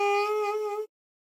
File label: Pungi Note; tintin reporter cigars of the pharaoh